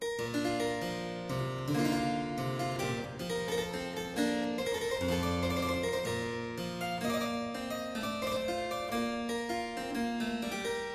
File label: Suite in C major - Courante, Harpsichord; Jacques Champion de Chambonnieres